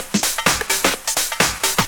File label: Discohouse Loop 128 BPM